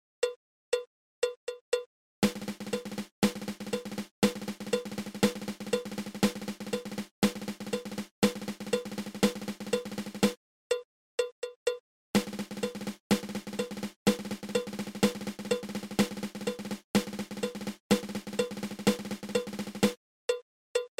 Triple Ratamacue

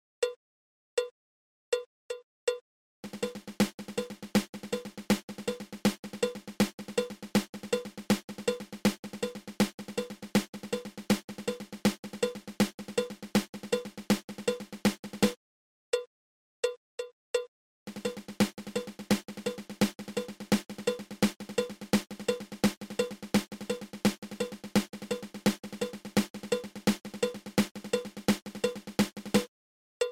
Single Ratamacue

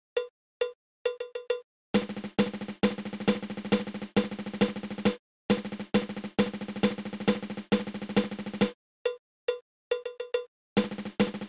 Paradiddle diddle

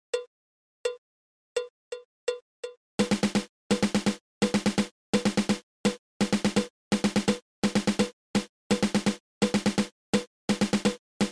Single Stroke 4

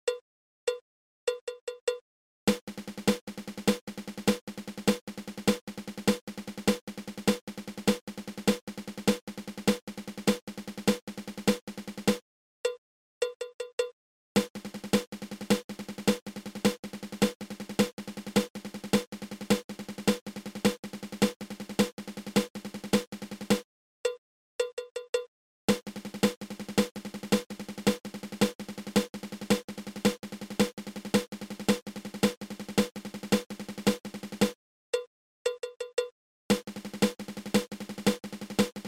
Five Stroke Roll Triple

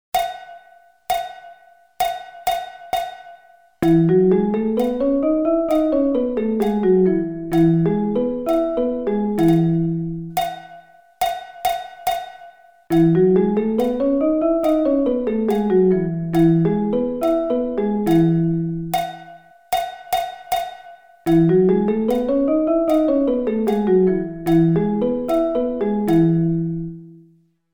E Scale

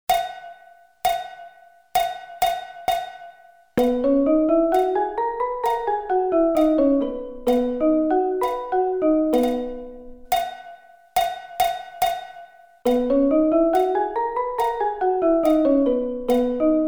B Scale

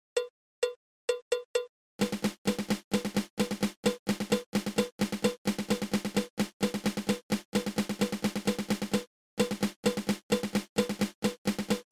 Flam Tap

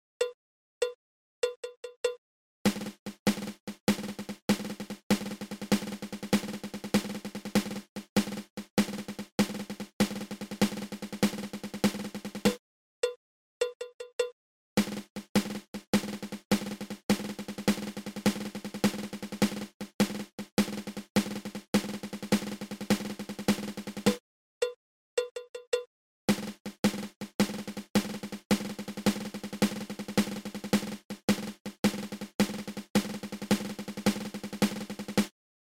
Drag Paradiddle

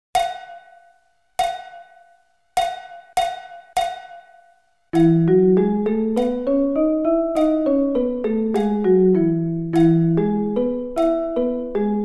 E Scale

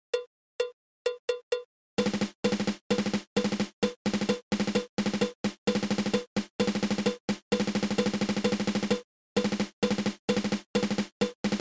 Triple Stroke Roll

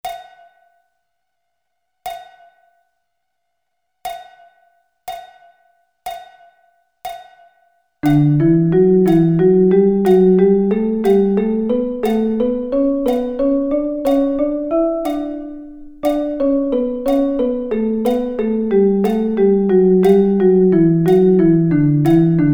Bb Double Sticking

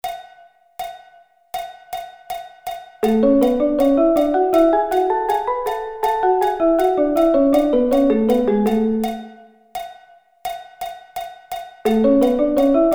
A Thirds

